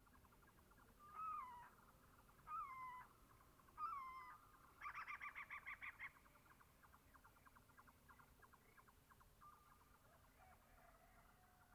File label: chuckle